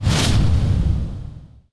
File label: text impact